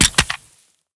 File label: pistol drop grass; STALKER; IWP